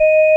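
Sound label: Vox D#5, organ Continental; Keyboards of The 60's and 70's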